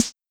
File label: Snare, Korg SR-120; Drums